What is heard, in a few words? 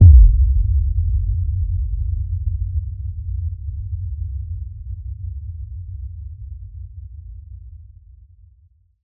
HIT Boom, Cinematic Hits Designed